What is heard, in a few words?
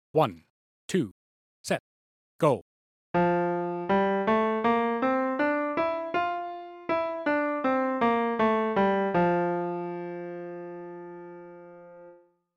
C 1 octave 80bpm, one octave scales